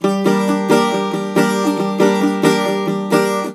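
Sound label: bouzouki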